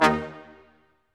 BRS Quintet stac; Roland L-CD702

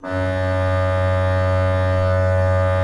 F1, Cathedral Organ

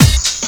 COMBI, Houseworx; Ueberschall; Workz-9

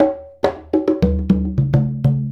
Congas; Essential Percussion

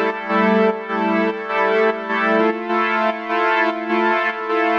Pad 100 A, sidechained

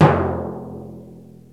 TIMPANY; Crypton - DrumBase5000

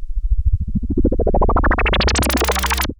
Juno Bubble

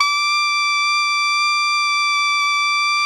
TENOR FF D6, saxophones; Greytsounds; Philip Wolfe David Crozier's Saxophones; TENOR SWITCH

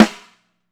GATE, SNARES; Sampleheads - New York City Drumworks VOL-1

